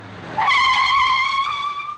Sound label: short auto pull up and high skid